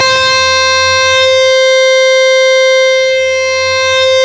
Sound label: C5, Synth Line; 90 s Sample CDs; NiceBeats; SynthLine VOL-33